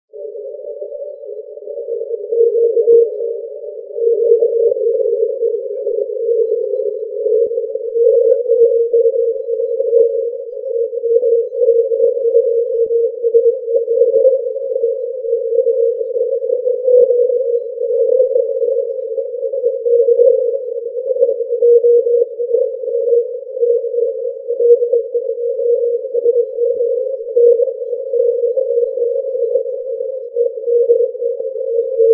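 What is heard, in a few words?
80m long path; Not a great LP opening this morning but from Latvia was Q-5.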